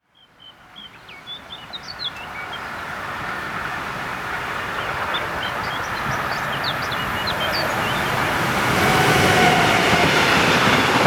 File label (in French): bruit joints de rails; sonotheque